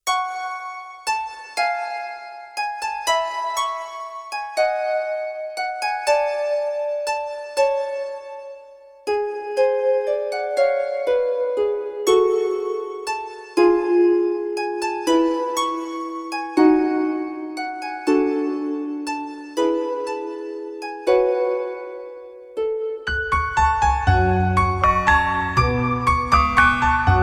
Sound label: bgm